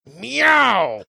aggressive meow

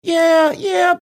singing yeah yeah

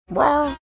quiet rawr

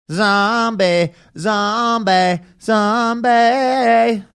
singing

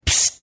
"psssssst"

